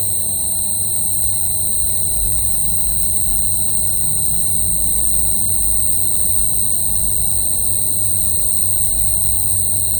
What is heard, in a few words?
creepy ambient electric h